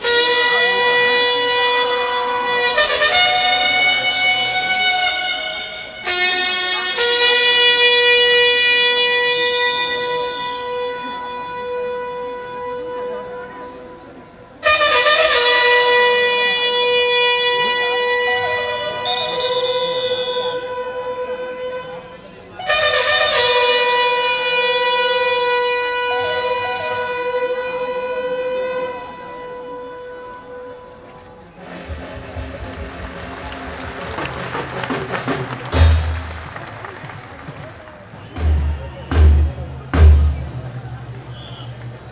Wagah Border Ceremony India Pakistan Border; Samuel Corwin; Selected Field Recordings from India and Nepal Volume II Religious Music

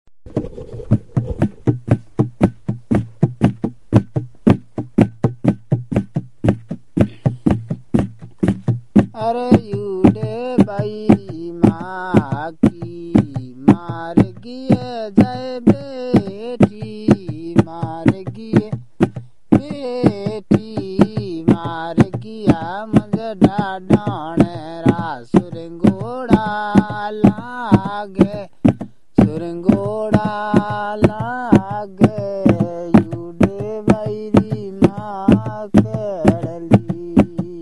camel guide song, India; WFMU

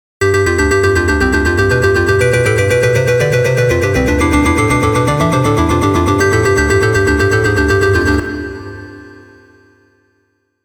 insistent electronica

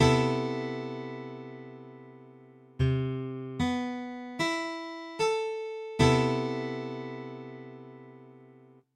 Cmaj13, Guitar Chords

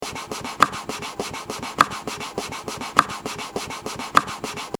scratch it

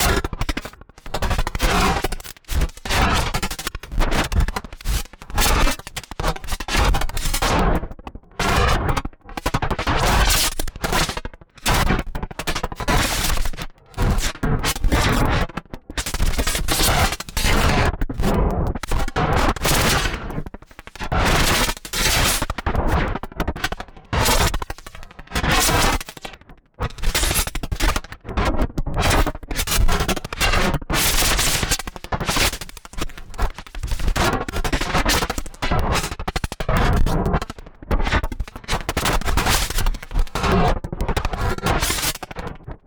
kick explorations

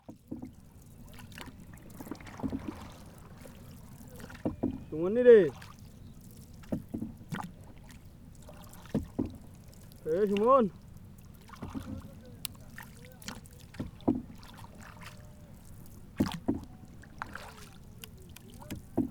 Canoe, Bangladesh; Les Cartes Postales Sonores; NOISE ON EARTH Vol2

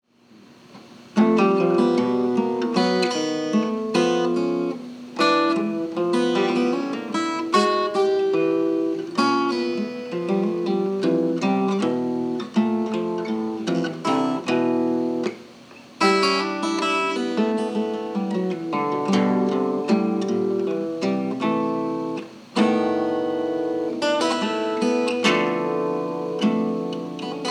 Piano Roll

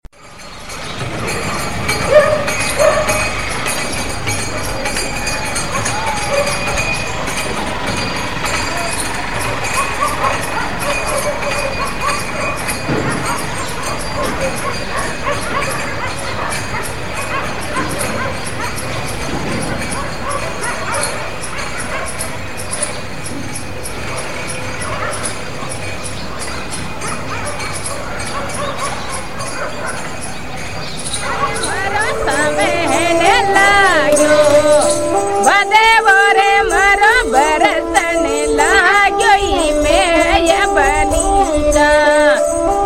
Music after Chipati, Field Recordings from India; WFMU

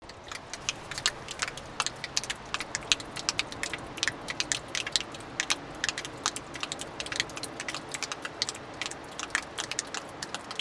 Drops; AUSTRALIA; Les Cartes Postales Sonores; NOISE ON EARTH Vol7

